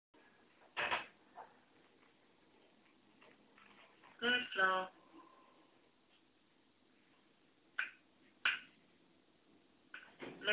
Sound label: lift